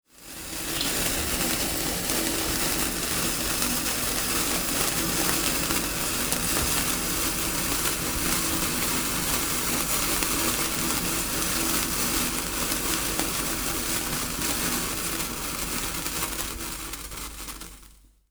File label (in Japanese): 手持ち花火